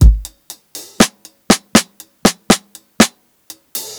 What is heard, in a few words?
120 HALF TIME, DRUMS LOOPS; BASEMENT DRUMS VOL 2